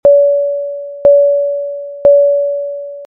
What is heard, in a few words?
Chime